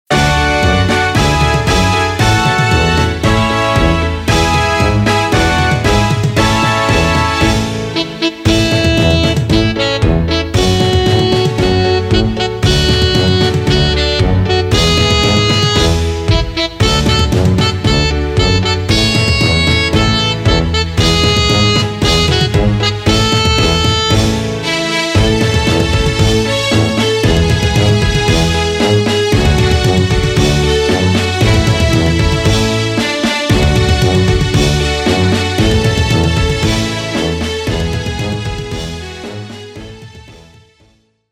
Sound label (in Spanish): himno instrumental; quito ecuador